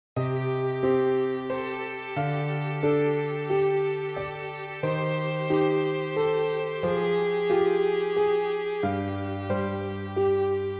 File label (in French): pour piano violons